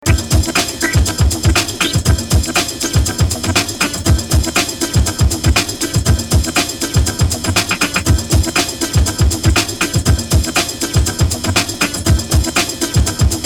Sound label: Modern Funk Beats